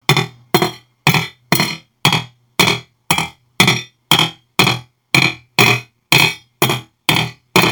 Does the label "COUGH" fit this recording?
No